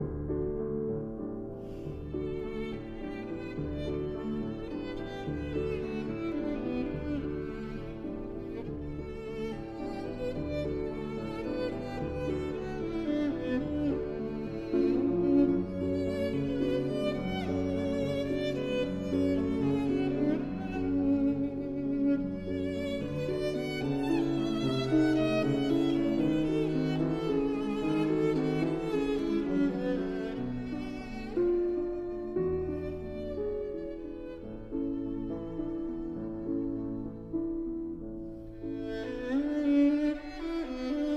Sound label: Violin and Piano in D